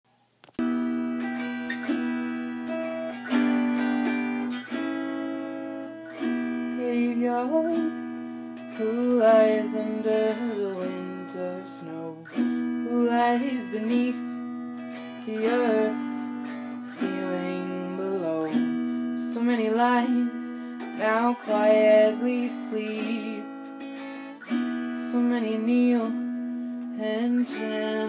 [F] Original Song [Singing][original song][autoharp]